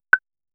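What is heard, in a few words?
stone ui interaction button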